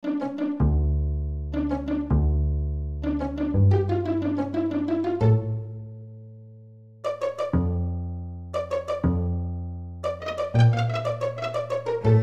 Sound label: pizz